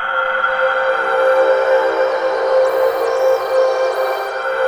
WS DA C 5, Korg Wavestation; Back In Time Records; Korg Universe VOL-1

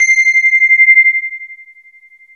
PULS LEAD; MORPHEUS

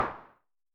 SN Filtered, Vermona Mono15 Drums Snare

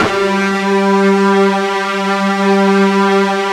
FUZZ G 2, Guitar; East West; Steve Stevens Guitar Samples Collection

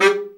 BRA Bari, SAX; Best Service Gigapack 1; BR SAX 2

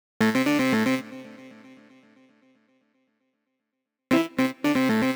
Clavinetish Synth, Am 115bpm; Zero-G; Nu Disco; Construction Kits; Trunk Of Funk

